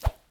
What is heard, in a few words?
zip; jocly; mana